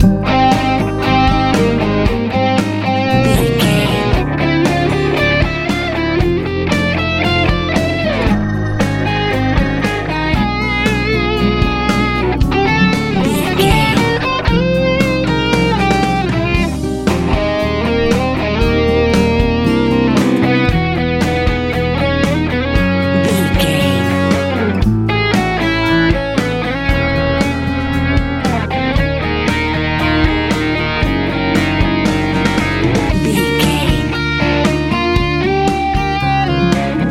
easy pop

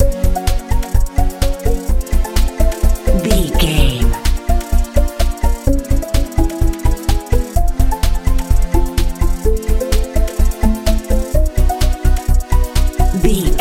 dance

